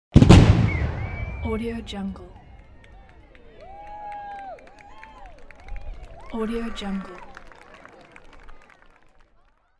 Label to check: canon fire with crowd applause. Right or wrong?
Right